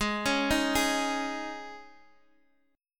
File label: Ab Suspended 4th, Guitar DADGAD; Absus4 arpeggiated; x,11,11,8,11,x; JGuitar